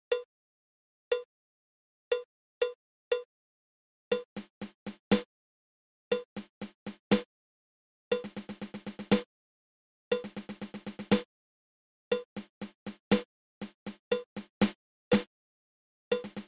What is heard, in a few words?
Nine Stroke Roll